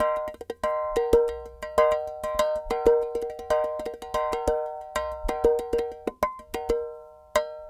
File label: clay pot, world percussion